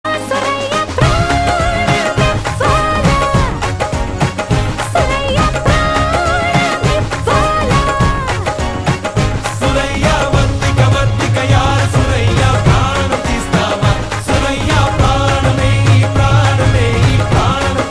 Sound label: Female Ringtone